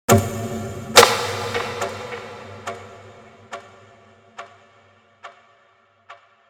clap

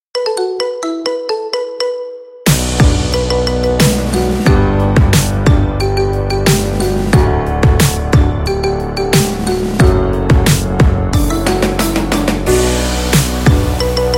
marimba remix

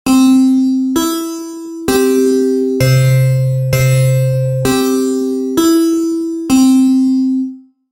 sms ding dong

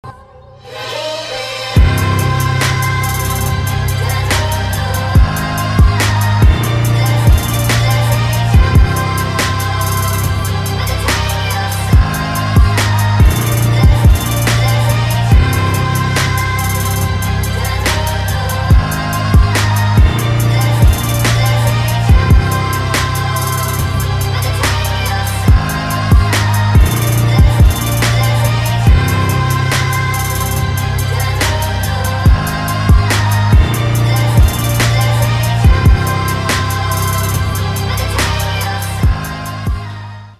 instrumental

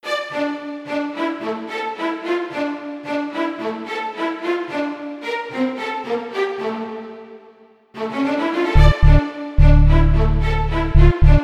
instrumental

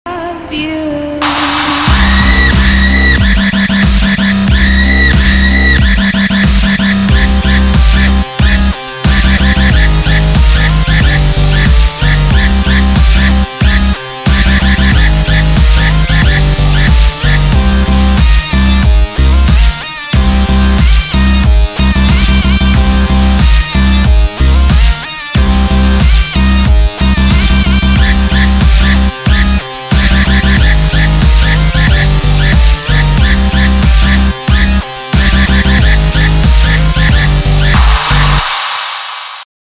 dubstep remix

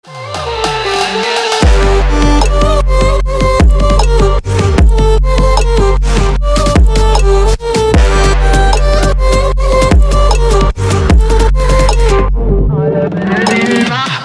festival trap remix

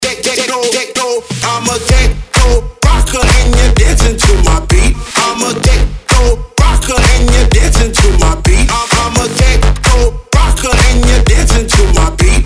techno rocker

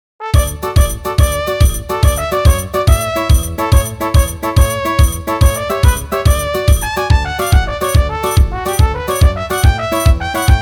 nokia hat dance mexicano

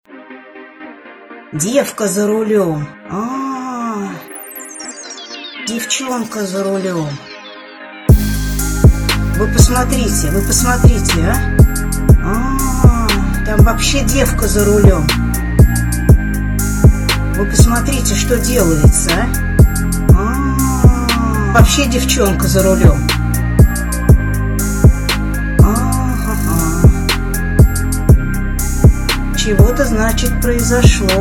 cloud beat

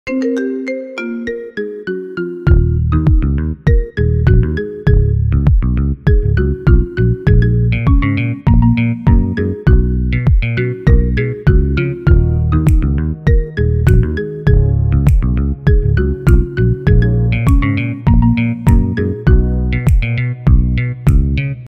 marimba remix

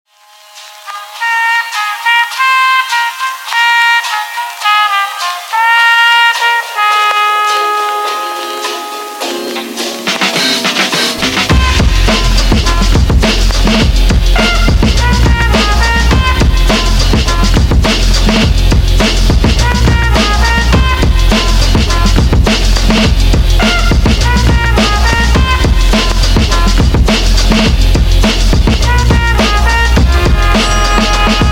last trumpet

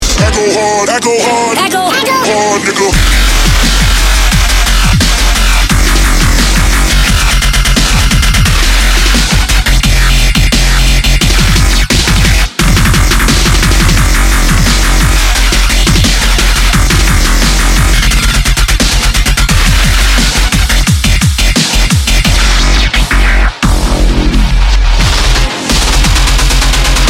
drumstep

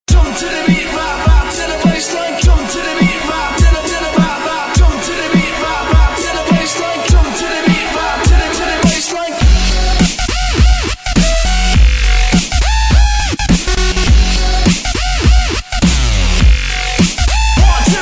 trap

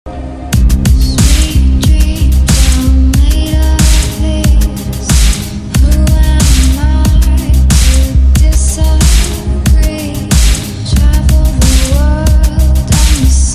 dubstep remix

